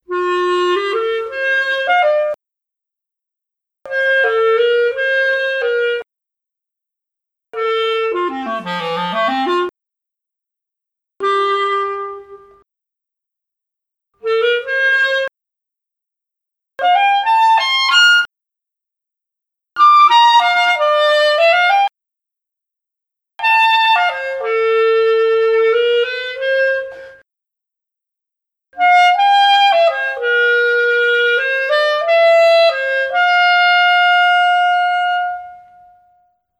clarinet

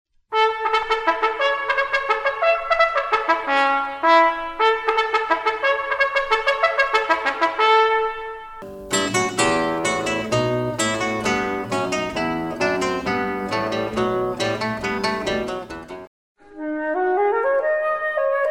instruments

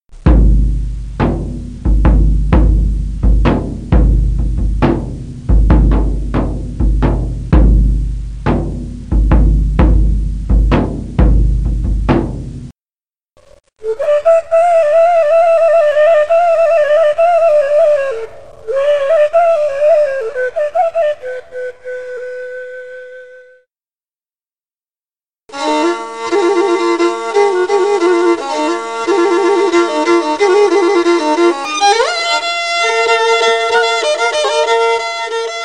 instruments paradosiaka

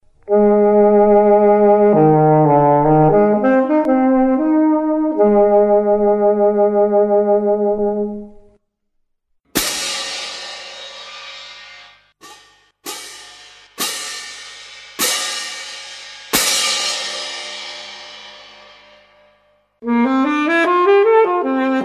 instruments

